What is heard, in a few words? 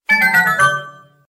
gold mouse text